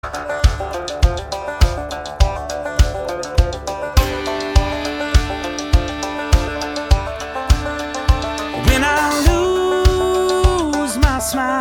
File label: radio banjo